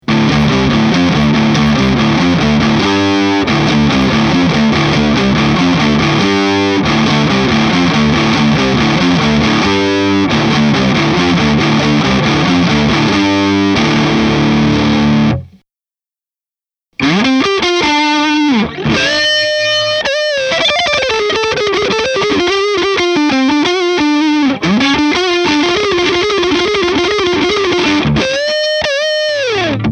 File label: V7 stock Ceramic